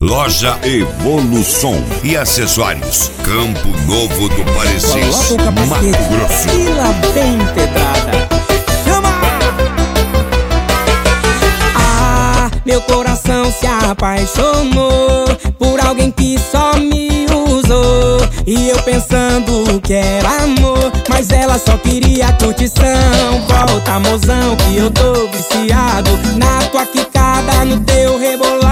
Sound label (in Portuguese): sertanejo; loja evolusom